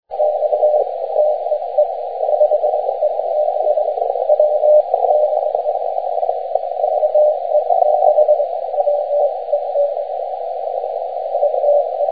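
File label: 80m long path CW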